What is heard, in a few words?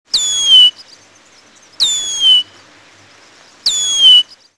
cowbird; birds